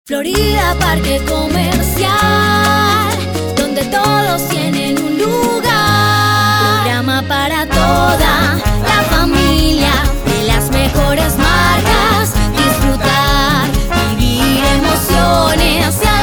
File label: jingle